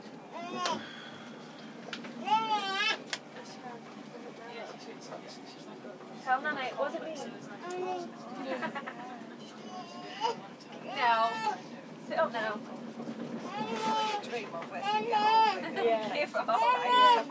noisy kid

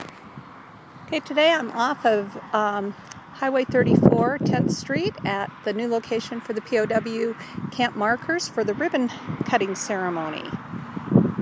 ribbon cutting at p o w camp

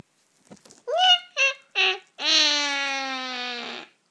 my daughter does a great witch s cackle

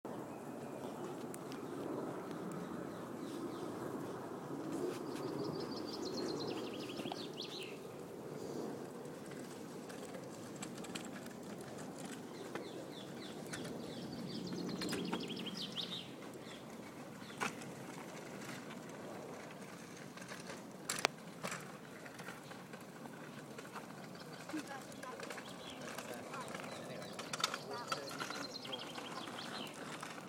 airplane with boats and kids walk; barnes bridge to hammersmith bridge

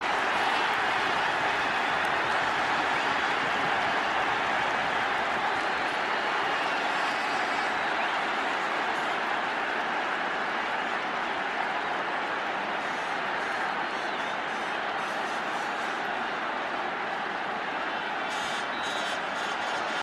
crowd

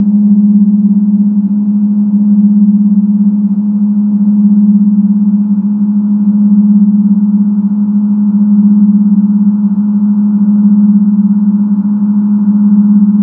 womb music

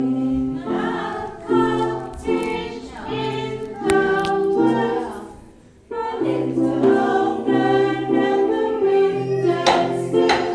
silver class singing

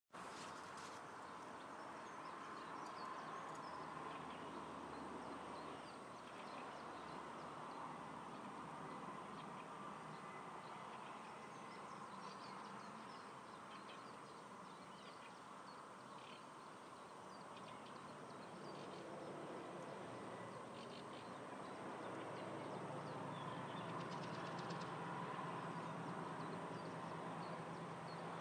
morning chorus outside my bedroom window birds; ashtordiffe

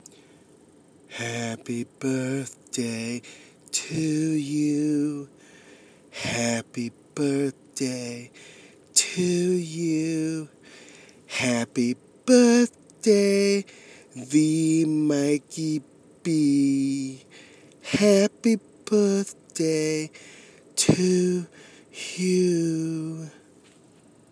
birthday song

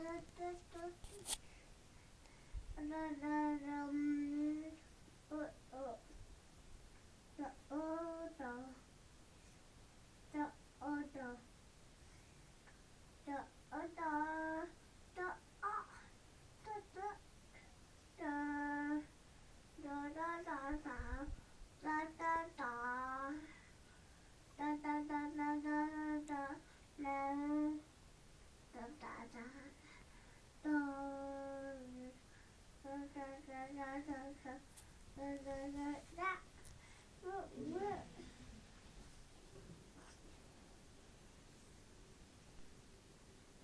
more baby chatter

